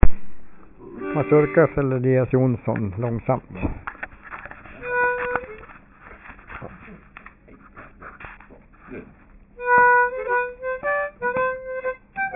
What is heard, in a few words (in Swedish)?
Mazurka; e E Johnsson; Arkivinspelningar